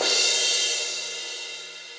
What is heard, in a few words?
Crash 14 Steely Tight, Cymbal; Drums; Drum Hits; Standard Hits; Session Drums Studio